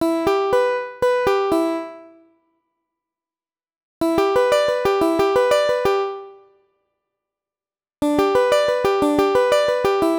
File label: sweep picking exercises